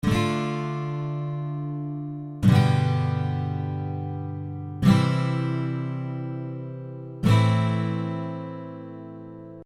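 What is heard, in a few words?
Guitar DGCA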